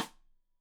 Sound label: Side Stick Stereo; Deeply Sampled Drums; SMDrum